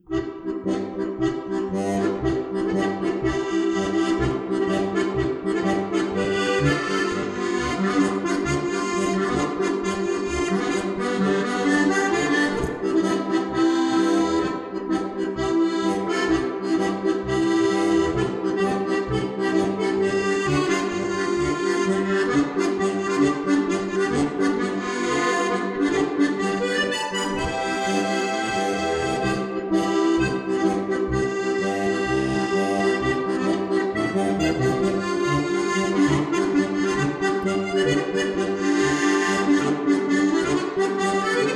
Happy Medley Polka

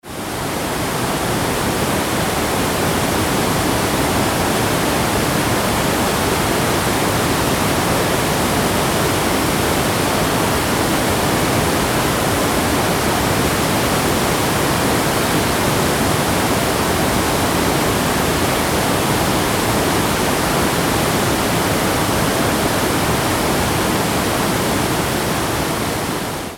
Gulfoss

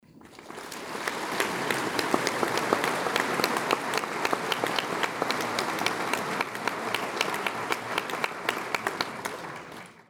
Applause

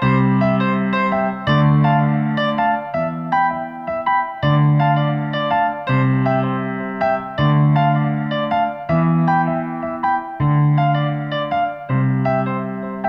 Grand Piano Theme - Loopable

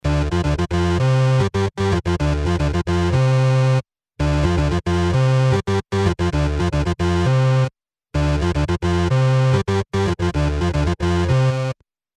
Game Faster Hippie Beatnix Theme

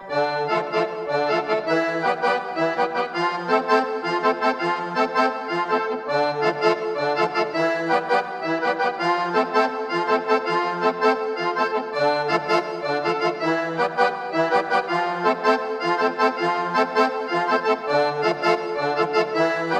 Concertina; Prima Concertina

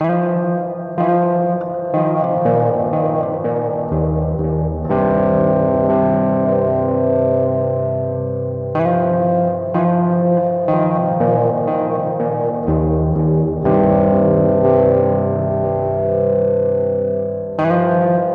Canjon Guitar

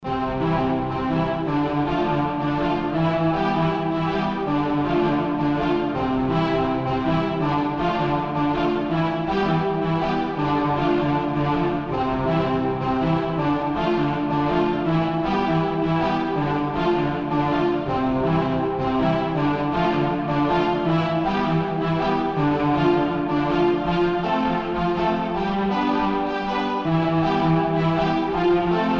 Strings Theme - Loopable

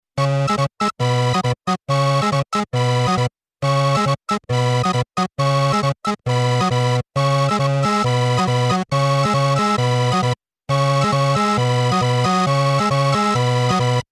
Game Tango Theme Ultrafast; 8 bit - Gaming - Schnell

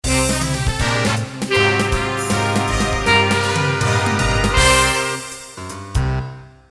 Bigband Jingle C

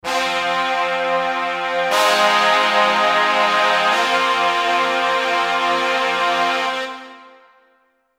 Duo Fanfare Jingle

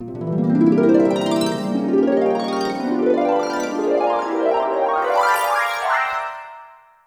Miracle Jingle G; Harfen Jingles für Wundervolle Sequenzen.

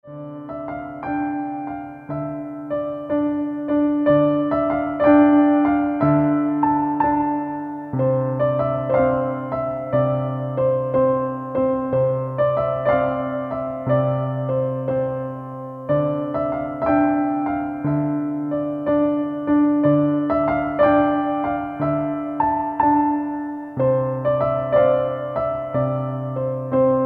Piano

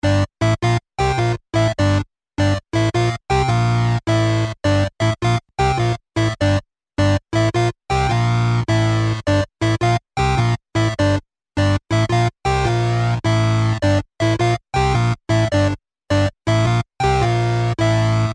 Game Walking Loop

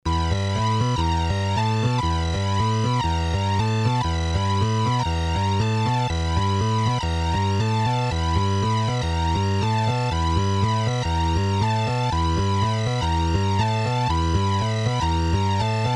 Game Ultrafast Playling Music Theme; 8 bit - Gaming - Schnell

